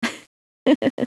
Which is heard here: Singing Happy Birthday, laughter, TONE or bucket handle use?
laughter